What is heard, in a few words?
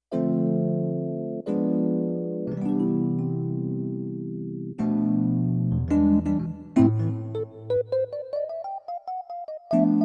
Stereo Rhodes, Roland VC-1